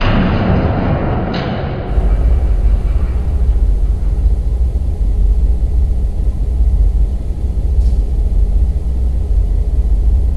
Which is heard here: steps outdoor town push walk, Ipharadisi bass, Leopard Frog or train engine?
train engine